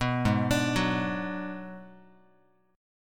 Ab Minor 7th Flat 5th Ab, down, Guitar, 4A, 4E, 5A, 5D, 5G sharp, 6C sharp; arpeggiated; JGuitar